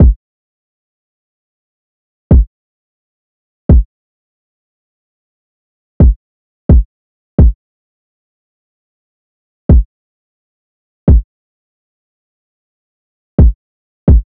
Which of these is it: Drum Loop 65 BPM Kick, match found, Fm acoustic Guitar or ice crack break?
Drum Loop 65 BPM Kick